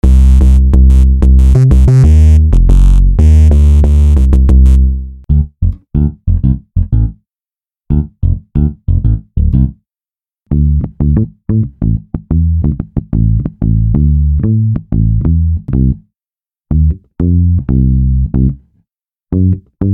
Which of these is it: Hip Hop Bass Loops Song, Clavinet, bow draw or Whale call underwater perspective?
Hip Hop Bass Loops Song